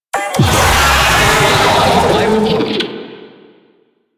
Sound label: Player Spotted, Vocals; Warframe; Proto Infested; Babau